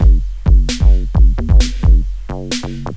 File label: electro; Guitar&Electro